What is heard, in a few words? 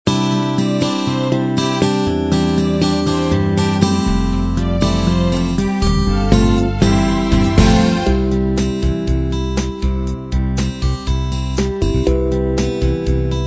standard 8 beat combined